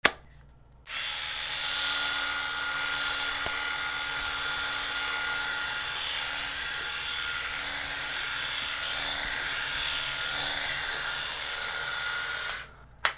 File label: BATHROOM